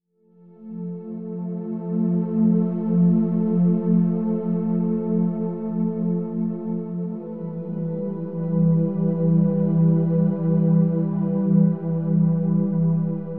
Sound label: Warm Waves; فرکانس963